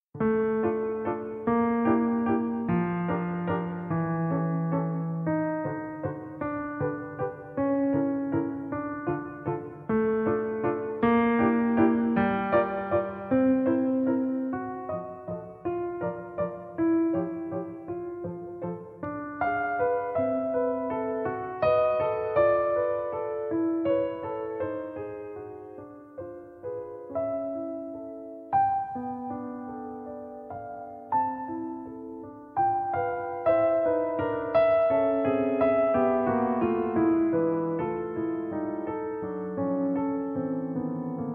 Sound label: miniature pastoral; bridge frank